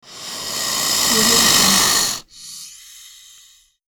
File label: snake bull; hollywoodedge